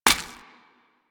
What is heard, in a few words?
Step Default, Footsteps; Foley; Warframe; Grineer Royal Jester